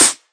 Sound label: SNARE, Volca; drums full kits; style kits; WallsVado Volca Sample Pack; Hip Hop